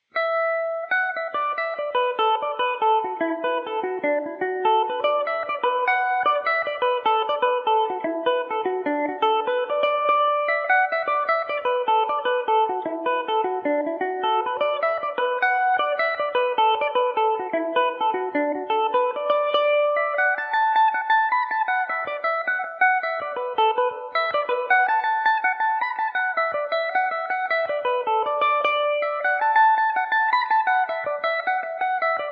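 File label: mcmahons favourite D major revelation, mandolin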